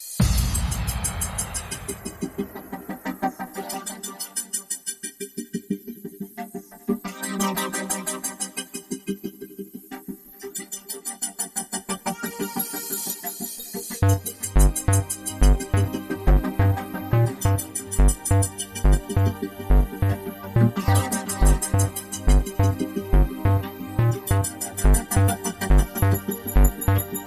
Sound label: Drummer